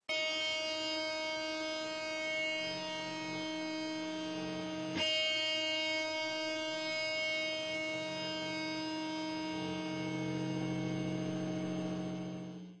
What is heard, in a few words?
heavy metal tune up 1st